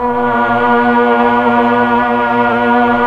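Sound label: pad B3, Tron strings + vox; Tron Str+Vox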